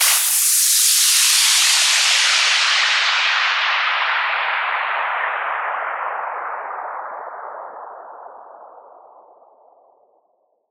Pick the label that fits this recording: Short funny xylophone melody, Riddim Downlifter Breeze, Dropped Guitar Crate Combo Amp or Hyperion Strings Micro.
Riddim Downlifter Breeze